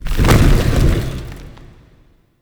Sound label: Boulder Stop, traps; Objects; Champion Crypt